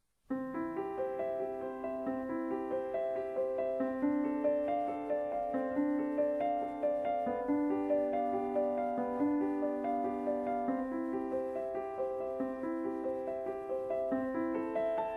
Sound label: Prelude and Fugue No. 1 in C major, BWV 846